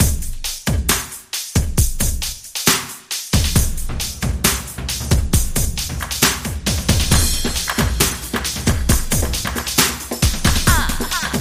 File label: Slam Girls Beat